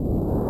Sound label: Spit Flame; Metroid (USA)